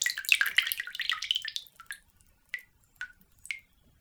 Dribble, Water Dribble

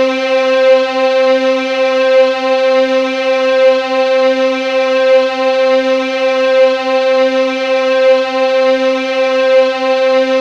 violins

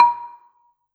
Gambang Hard Beater, Gamelan

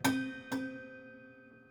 Prepared Piano

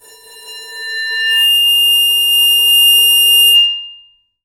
Bowed, Large Wine Glass

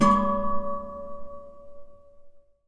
Classical Guitar Buzz Pluck

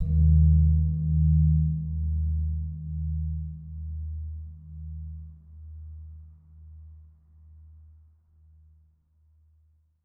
Suwukan, Gamelan

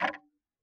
Tick, Clocks Kitchen Clock

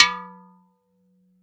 Flower Pot Hard; Flower Pots

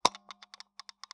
Taxi Meter

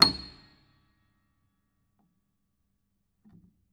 Note High, Piano; Piano Miscellaneous

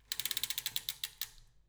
Back Pedals, Bicycle

